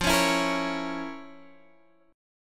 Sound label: F Major 7th Suspended 4th Sharp 5th, guitar chord